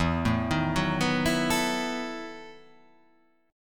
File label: E Major 7th Suspended 2nd Suspended 4th